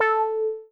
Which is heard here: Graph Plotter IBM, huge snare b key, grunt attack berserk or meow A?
meow A